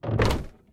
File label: library furniture stepstool